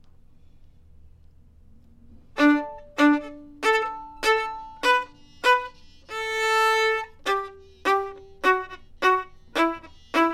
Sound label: Twinkle Final Violin